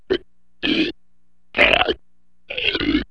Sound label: BELCH